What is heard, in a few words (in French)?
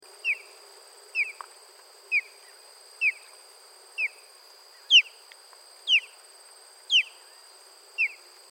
balbuzard pecheur, chant